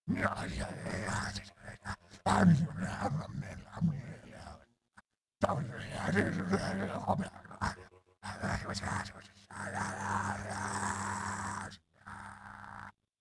Zombie

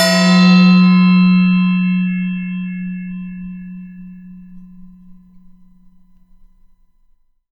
Clang

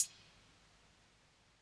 Hat

